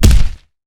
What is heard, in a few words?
Creature Footstep, Horror Effects